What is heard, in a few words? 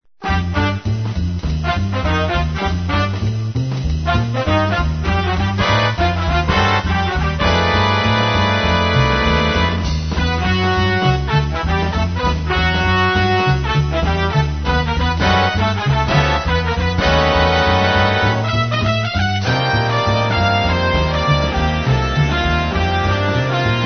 panic bigband